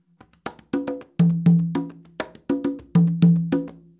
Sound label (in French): rumba ternaire, congas